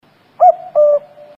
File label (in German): Kuckuck